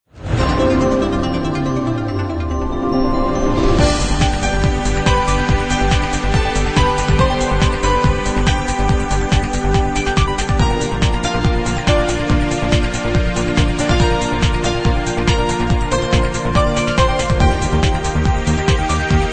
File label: World News